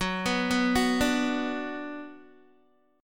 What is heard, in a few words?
Gb Suspended 4th Sharp 5th, Guitar; arpeggiated; 13,14,11,11,14,x; 4F,4A,5C,5G,5C,6E